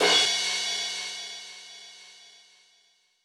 Roland U220 Electric Set, Drum Machines

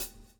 SWNG

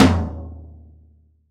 TOM F, CTD TOM

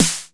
909 SD, TR-909; Best Service Dance Mega House Dance

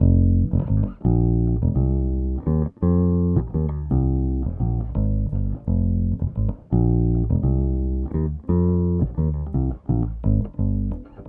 Bass, 70s Analog Funk

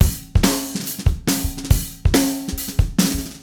Acoustic Kit, Drums & Percussion

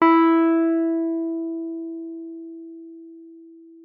WUF E4, WURLITZER; Xsample VOL-1

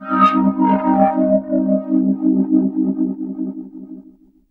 SE OVERD., Overdrivin'; E-MU Producer Series Vol. 6 – Analogia Project; Strictly Synthe; SE-1

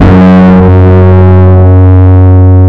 F#2 BASS; Zero-G - Chemical Beats

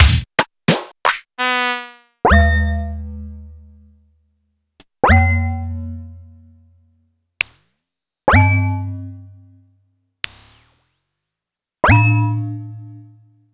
Roland U220 FX Set

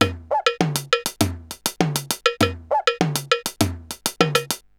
RX17 Perc (100BPM), cyborg beats; musicradar

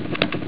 window open; ST-XX Amiga Soundtracker Sample Packs

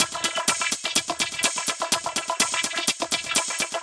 125 Hats, Drum Loops 125bpm; Zero-G; Soundclash Nu Dance Electro; Acidized Wavs; Heavens Above

